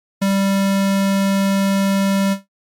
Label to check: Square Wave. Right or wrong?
Right